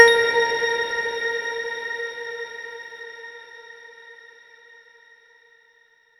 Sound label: Tone